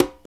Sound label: PRC PDC CO, Latin; Roland - Rhythm Section; Latin 4